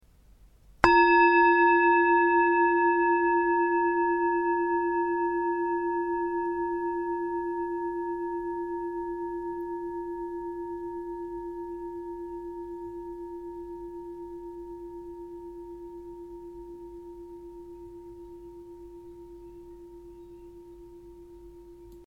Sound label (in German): Klangschale